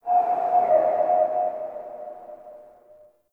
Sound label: Gas City Distant Wind Textures; Warframe; Corpus Gas City; Ambience